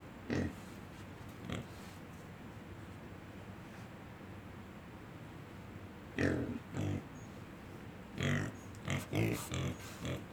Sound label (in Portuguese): Porcos respiracao com grilo longe; animais; Coleção Sonora do Cerrado